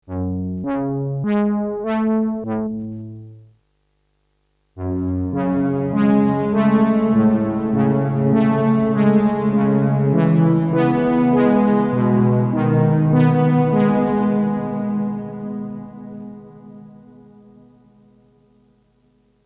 Synthesizer Plate; Boss RV-200

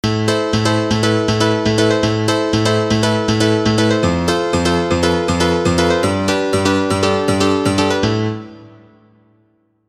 M1 Piano

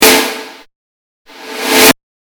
Snare normal reversed

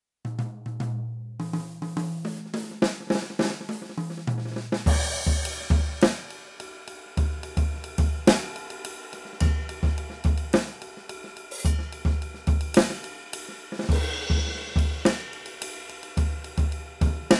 YAMAHA DTX10K M Classic Jazz Kit

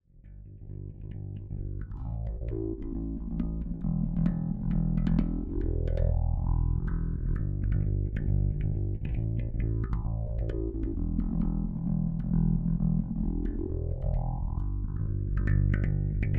Phaser Space Rock

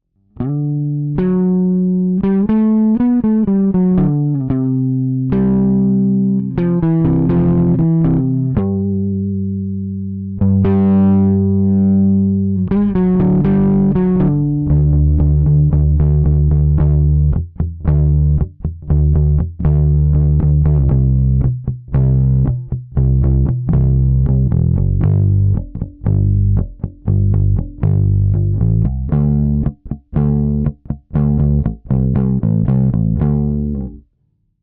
Drive EQ bass boost low mid boost treble boost; Aguilar Roses Fender Tonerhammer; Drive 18

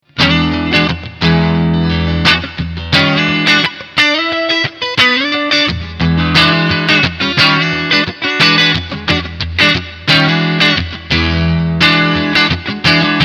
Fender Strat

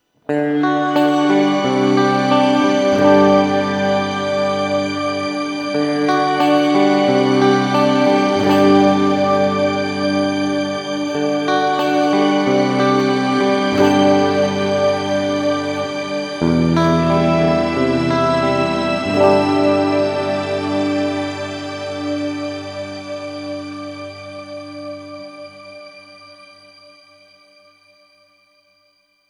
Stereo Reverb; Neunaber Illumine

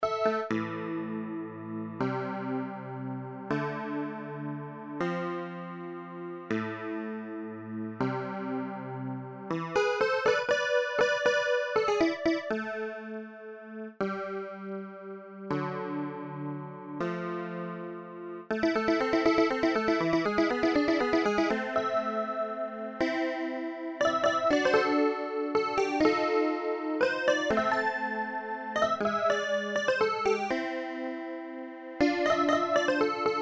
Blofeld Clarinette

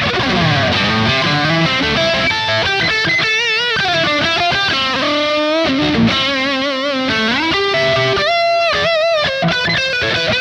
Charvel Signature Bridge and Neck Highgain Lead; Marco Sfogli

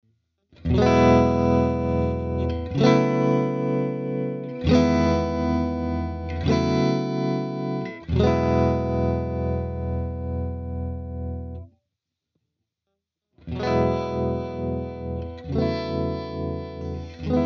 tremolo

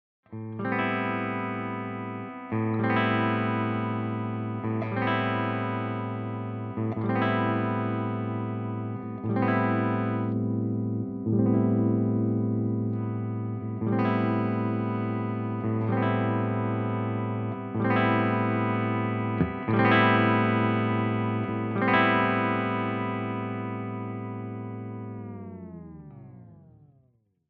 RGT1270 clean Tone Poti

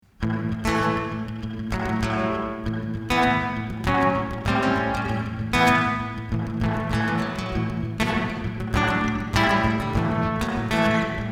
mooer shimverb pro digital reverb

